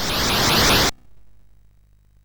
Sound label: sid MOS6581R2; hvsc